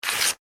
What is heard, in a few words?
paper rip; Sheet Of Paper Ripping / Tearing; foley; Objects; McKinney Sound